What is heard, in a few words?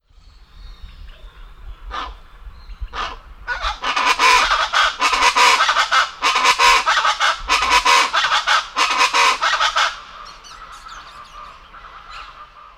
Ortalis canicollis; B Melgaco; Silveira